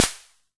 SD A; Olson XX-100

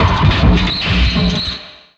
Vinyl Bumpers, Fx; Sonic Foundry (Sony Creative Software); Esoterik Beatz

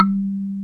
KALIM, ethnic; Rubber Chicken Software Sample Bank

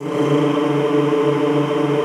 D2, Extended Classical Choir; Best Service

